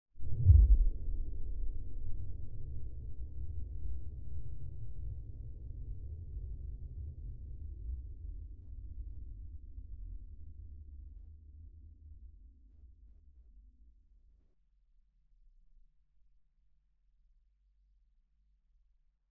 crunch woomph

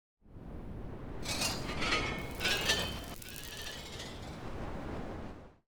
crunch zones 3D

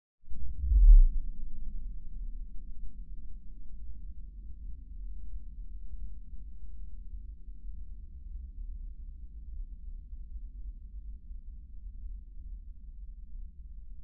crunch woomph

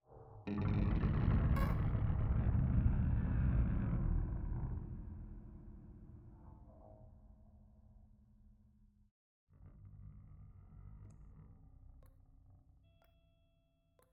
Guitar Piece

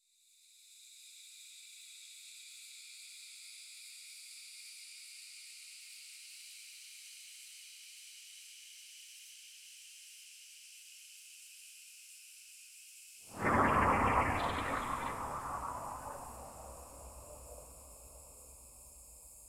crunch strata

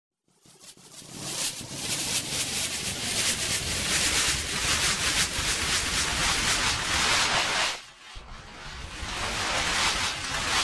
In Flight Sparse to Thick

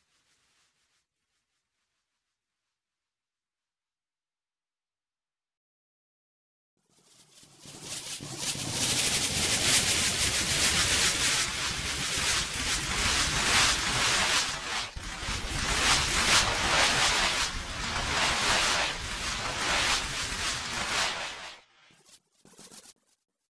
In Flight Sparse to Thick

